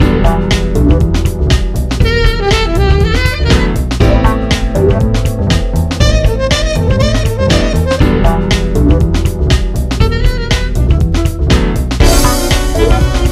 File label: music